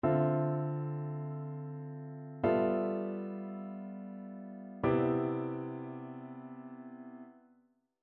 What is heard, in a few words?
ii V I progressions; ear training